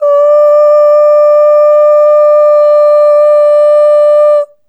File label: Ooh Long D4 Dry; Harmonic Building Blocks